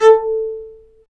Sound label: STR, Chamber Marc; String Master Series; Combos 4